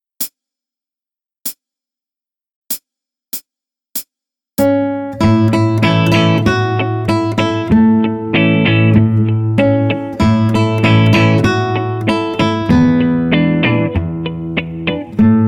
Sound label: New River Train (Folksong-USA), Guitar; Play Guitar Gitarrenschule 1